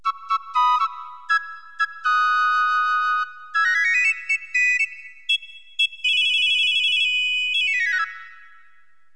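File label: piccolo; Roland; An Invitation To The Roland World Of Music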